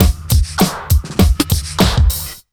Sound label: 100 BEAT, Pure Electro; USB Soundscan vol.03; AKAI